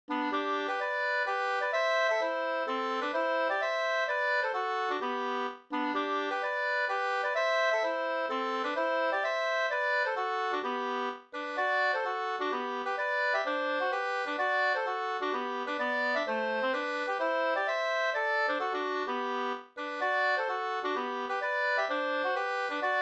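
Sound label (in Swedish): polska, tvåstämmig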